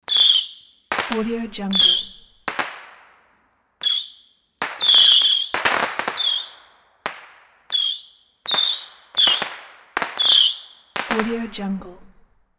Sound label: fireworks launching into sky with whistling screaming